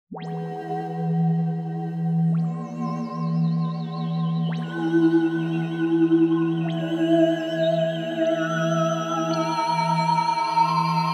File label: Crystal Forming